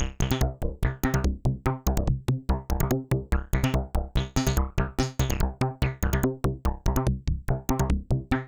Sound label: C3, Synth; 90 s Sample CDs; NiceBeats; SynthLine VOL-21